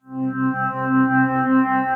Bow, Strings; Dream

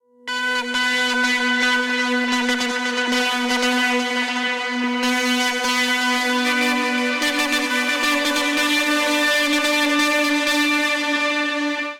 Drone Cm 80 Bpm; MIDI Clips; Instruments; Vortex; Ableton; Drone Lab

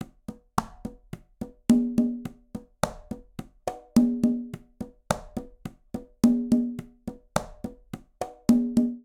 Charanga Cha-cha-cha Conga-106bpm; Latin Percussion; Ableton; Factory Packs

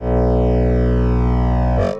Scan Position Random, Granulator; Ableton; Granulator III; Device Templates

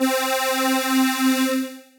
Notchphaser, Operator Synth Pad

